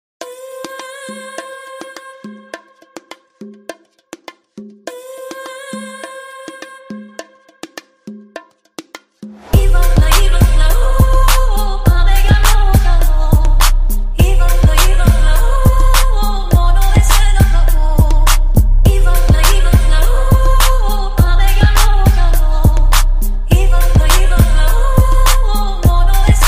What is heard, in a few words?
arabian